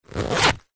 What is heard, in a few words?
foley luggage bag small fabric zipper fast